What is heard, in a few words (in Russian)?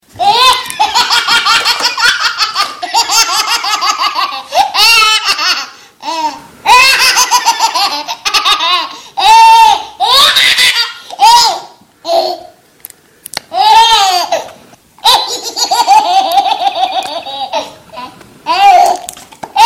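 detskiy smeh zvuki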